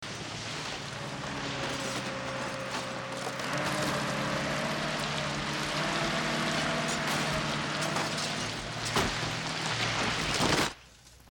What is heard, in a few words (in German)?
abriss